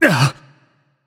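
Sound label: nonverbal surprised; voicepack; smite; merlin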